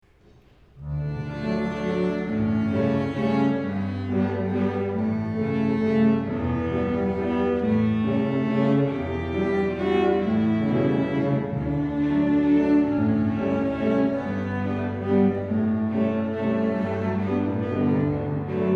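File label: School Year 7 and 8 Orchestra Waltz; Stover School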